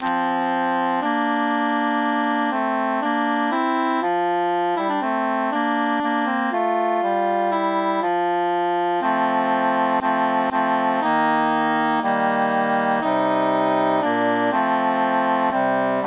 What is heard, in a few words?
cantus mute